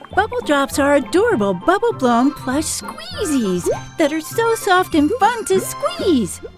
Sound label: Bubble drops